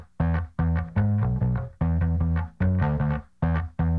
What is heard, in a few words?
bass; musdb18